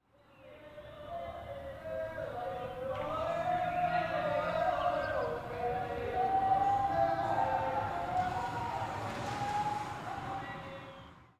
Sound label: Drunks Far Off